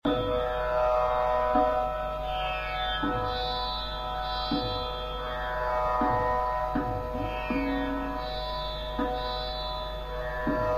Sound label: Sadra - Jhaptal Dheema, Vibhas; Jago Nandlal Rain Beeti